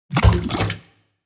Aquatic water game menu ui unlock item

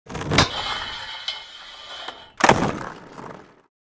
Skateboard grind rail

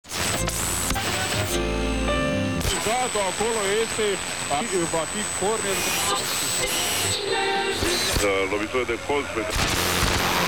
Radio FM tuning vintage recording

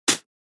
Human spitting single spit

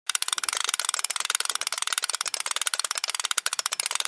Steampunk gears movement mechanical loop

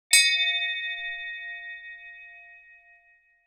Handbell single ring

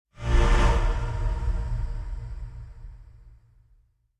Ghost passing horror accent

